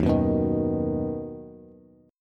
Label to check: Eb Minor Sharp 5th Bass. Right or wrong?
Right